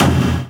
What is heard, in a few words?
Drum Barrel Finish